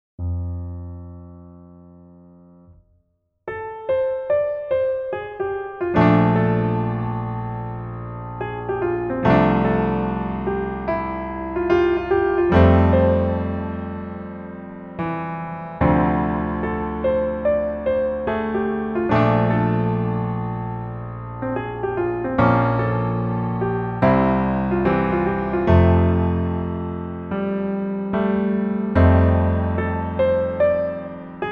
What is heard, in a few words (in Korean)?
Piano ver 멜로디MR 남자키